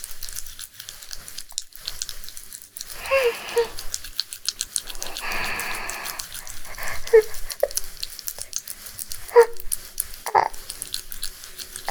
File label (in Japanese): 丸まり 絶頂 余韻